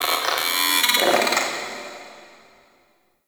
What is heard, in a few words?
Guild robotic chatter, Ambience; Warframe; Misc Remaster